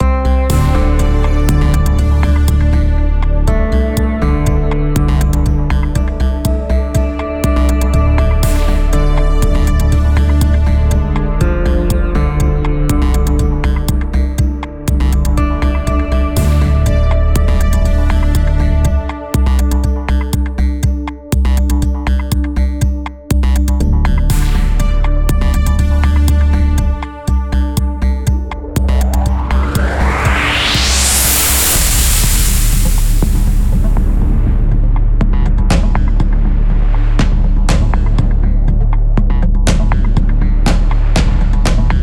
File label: Tense; Everspace 2